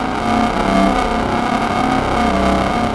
radiation, ambience; artus; Star Wars Jedi Knight II Jedi Outcast; XBOX

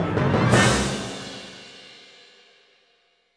battle lose, music

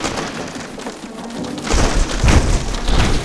ship dismast; Sid Meiers Pirates; XBOX

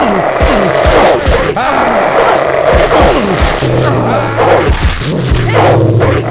Wolf Attack; Skaal; The Elder Scrolls III Morrowind GOTY; XBOX

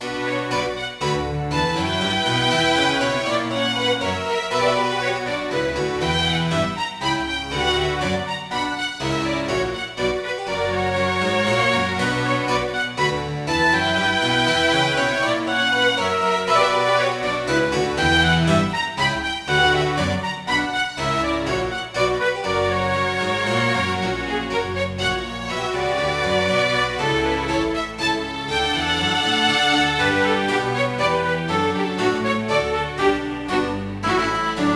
dance normal; Sid Meiers Pirates; XBOX

